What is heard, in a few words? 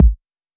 Kick